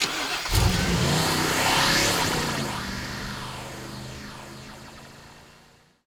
time engine escape; teardown; ps5 windows xbox series xs

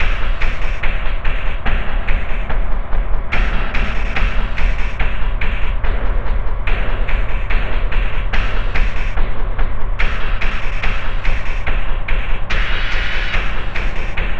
Mini Boss Tunnel Loop; Insanely Twisted Shadow Planet

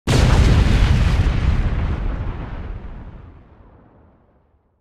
Atomic Bomb; Tank Stars

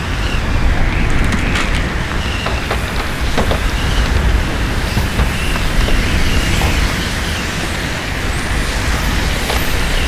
tornado; teardown; ps5 windows xbox series xs

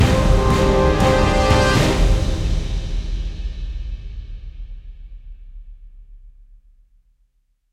Action End Short; assassin s creed chronicles india; First Civ

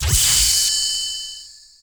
Fx Firefly Zap; Levels Hub Orleans 06 Target Shadow

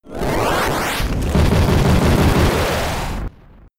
Magma Storm; Pokémon SFX Gen 5 Attack Moves

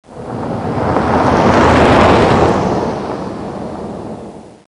JEEP; blood fresh supply

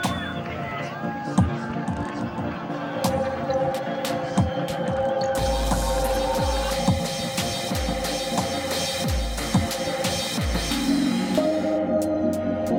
gameplay interstitial

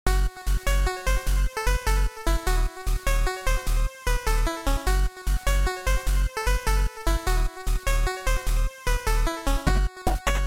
BGM Shop

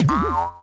Wrong; lego my world first steps

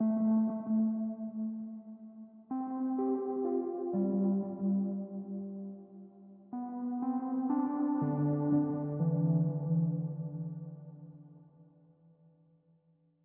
Stingers Piano Mellow

